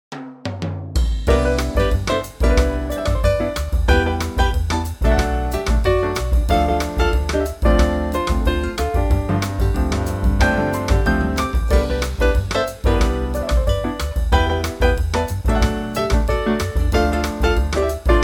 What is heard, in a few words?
C quartet